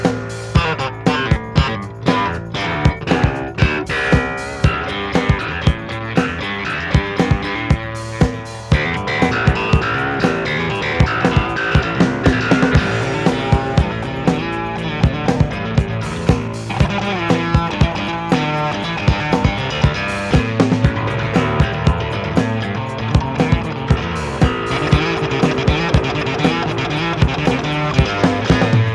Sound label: Bass Solo